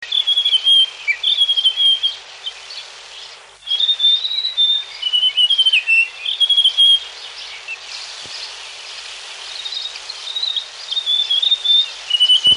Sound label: Grey warbler, Bird Calls